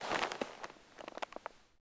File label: Leather Creak Long; Civ4 warlords